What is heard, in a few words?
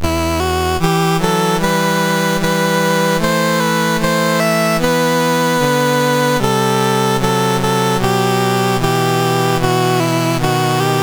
Israel, National Anthems; Dave Marquis